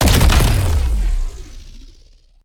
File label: sndshotgun